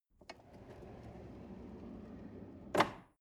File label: drawer medium close draw